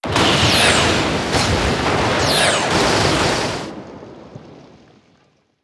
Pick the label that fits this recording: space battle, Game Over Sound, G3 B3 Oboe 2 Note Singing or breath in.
space battle